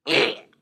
death grunt; SoundsCrate

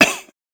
cough; soundscrate

